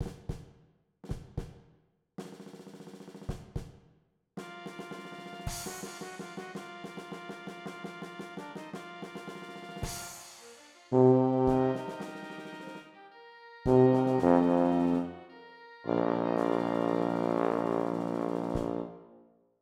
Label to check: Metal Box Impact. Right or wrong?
Wrong